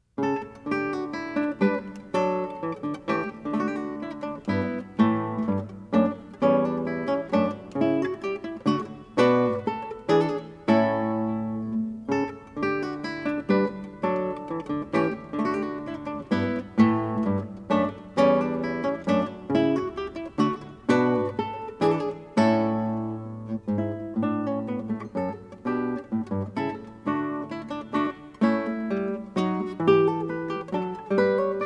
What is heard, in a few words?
baroque guitar - passepied; handel